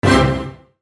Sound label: ORCHESTRA HIT